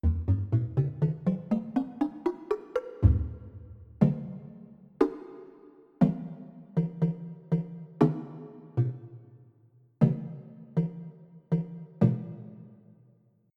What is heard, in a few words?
Wood Block